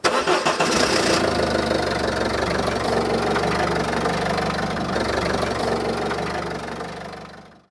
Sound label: diesel